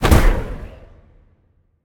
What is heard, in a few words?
Cast Arcane; smite; god merlin